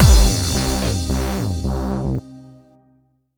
MUSIC EFFECT Dubstep Negative; stereo; Universal Sound FX; MUSIC EFFECTS

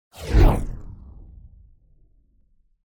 Whoosh Fast And Deep Passing; Hollywood Edge; sound designer's toolkit v2

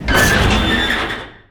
Door Large Metal Lid Open; Doors

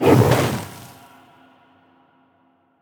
Fire Spell; AudioWizards

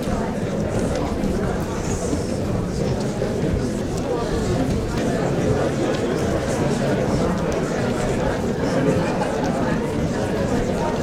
crowd talking, interior; best service Blue Box; crowds interior

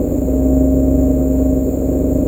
Sci-fi UFO Loop; SFX Kit; Sci-Fi Loops

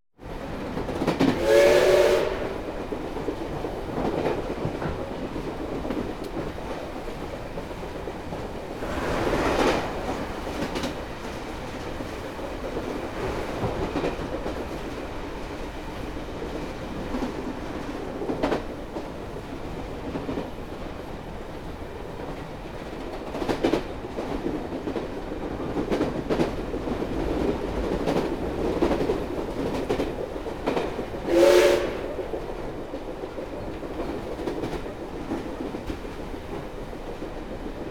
Steamtrain General Atmosphere On Board; KMP Film Video Sound Effects

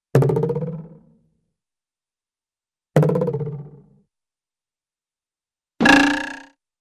Wooden Twang, Cartoon; Hollywood Edge; Cartoon Trax

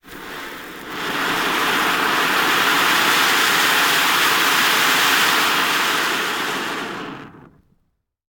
Fuse Flare; Sound Ideas; Guns

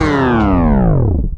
Menu Powerdown; Menu FX

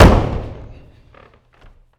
Boxing Ring Bodyfall

